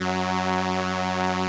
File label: SOLINA, String Machines